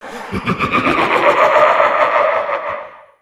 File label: laugh hallow; dota; conquest